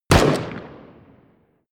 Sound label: pistol distant shot; schusswaffen